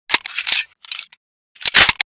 Colt45 Reload

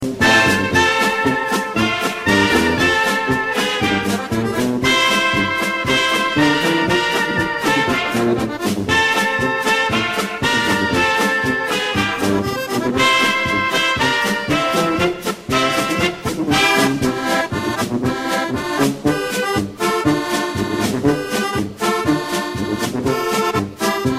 polka

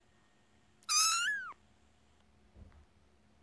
Kitten cry

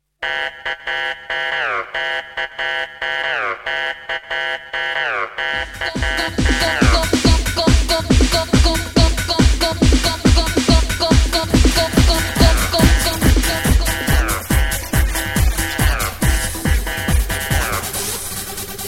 Happy Hardcore

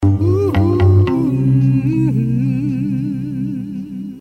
Woow

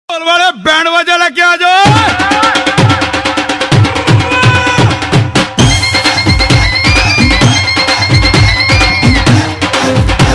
Dhol

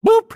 Boop; Twitch

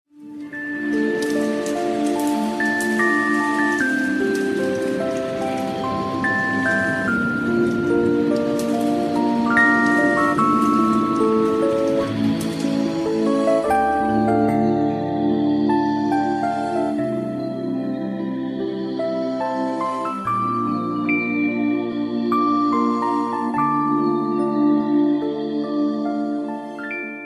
Rain classical guita

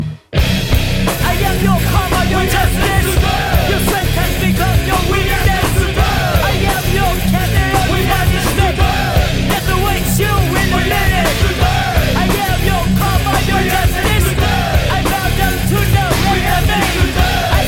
Battle Cry

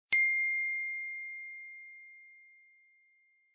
IPhone notification

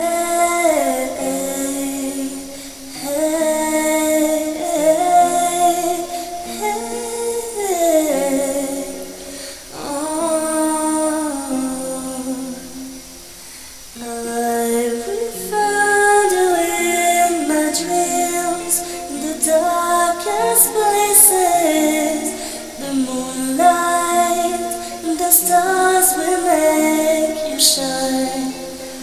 Piano Voice

